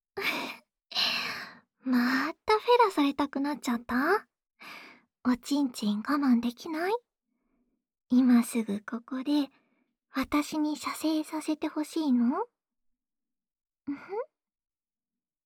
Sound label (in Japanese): オナサポ用フェラチオループ; 青春青春べろちゅー委員会♪